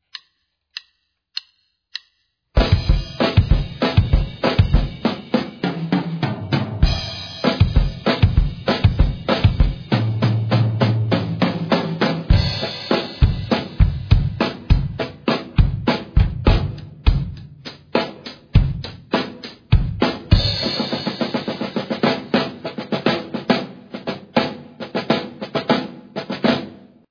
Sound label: Drumset; First Steps In Drumset